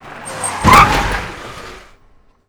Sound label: van skid to stop; favela; Call of Duty Modern Warfare